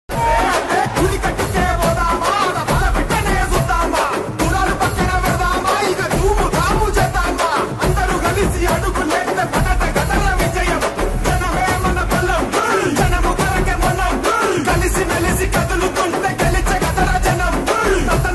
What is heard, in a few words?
Song Promo; Telugu Private Songs